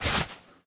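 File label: cutting fabric with sharp saber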